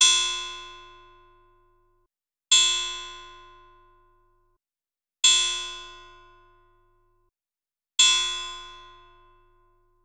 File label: bell moving partials